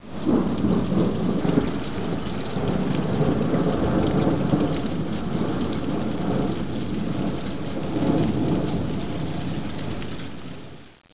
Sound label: rain and wind